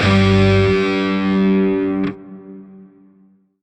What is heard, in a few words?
Shawzin Pent Maj Chord J; Music; Lotus Shawzin; Warframe